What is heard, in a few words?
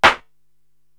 Hop Clap, Korg TR-Rack drum machine